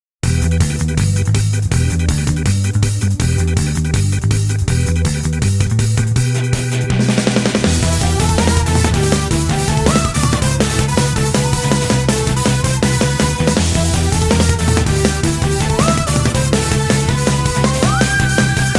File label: Boss Battle Arrangement; Mega Man & Bass